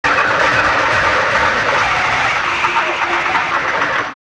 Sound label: Kaos FX, Ambient Grooves